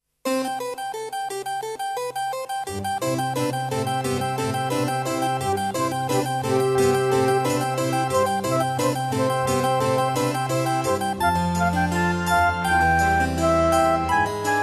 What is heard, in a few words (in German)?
jingle; hoersketch